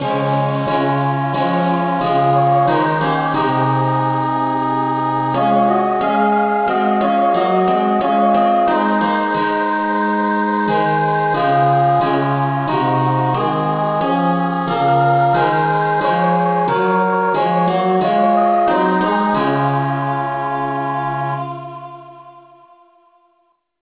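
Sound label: saint thomas rev choir key d flat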